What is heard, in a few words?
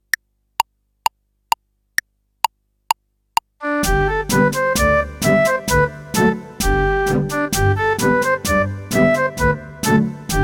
German Dance, fife